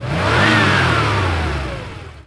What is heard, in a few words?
88 Pontiac Rev; Automobiles